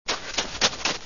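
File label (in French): pas course neige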